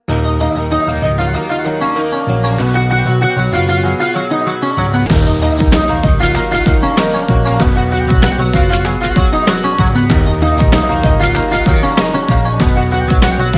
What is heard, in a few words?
instrumental music